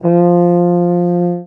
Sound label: Euphonium F4; 音MAD; 吹响吧！上低音号